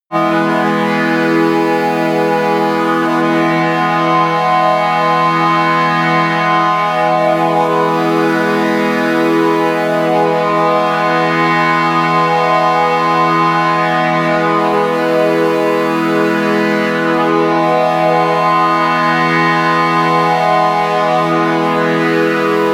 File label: organ air; flaechen